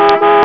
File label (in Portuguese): buzina